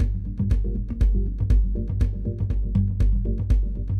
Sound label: Mallimban Gm7 120